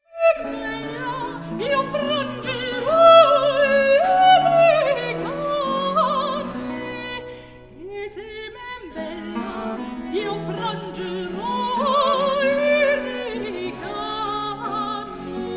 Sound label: serenade for soprano 2 violins continuo; barbara strozzi; hor che apollo